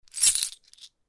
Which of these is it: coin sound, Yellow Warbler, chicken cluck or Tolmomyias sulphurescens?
coin sound